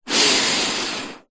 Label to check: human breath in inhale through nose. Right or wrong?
Right